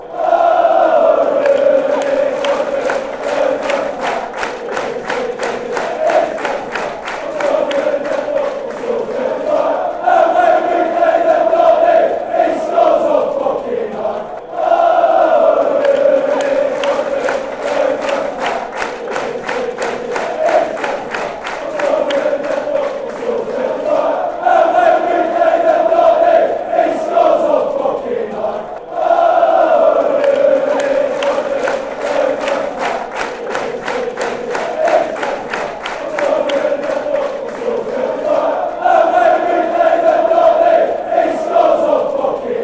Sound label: iwan roberts is a welshman fanchants